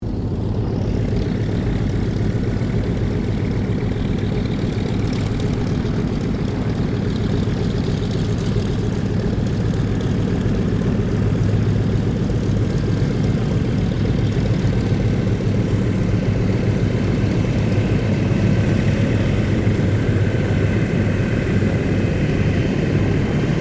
AIRCRAFT DOUGLAS DC3 TAXIING SHUTDOWN STEREO, commercial aircraft; Airplanes